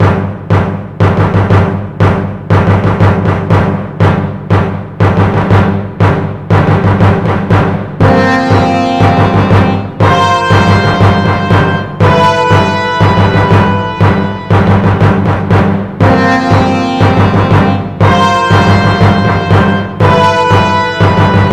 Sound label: Airship; List of Super Mario 3D Land media